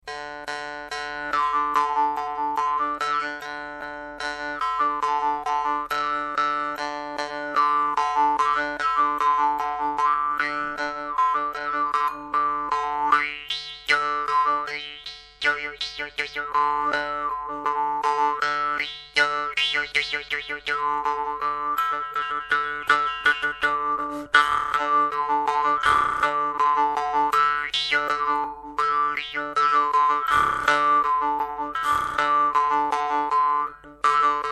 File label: marchanga iron; vargan; nepal; marchanga iron standart